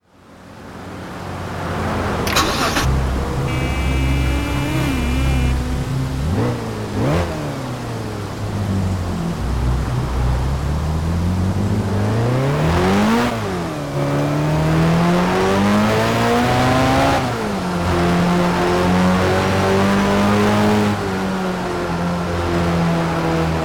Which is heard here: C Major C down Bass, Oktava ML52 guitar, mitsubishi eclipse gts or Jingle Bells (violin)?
mitsubishi eclipse gts